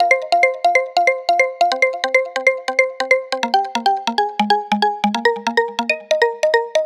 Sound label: Arp F 140 Plink, Melodic Loops; Ghosthack - Color Bass Essentials